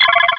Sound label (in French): Cri